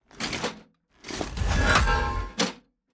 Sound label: hammer of justice strike